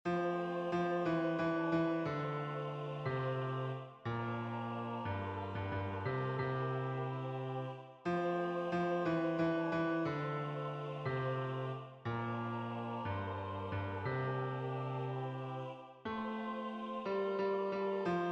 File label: bass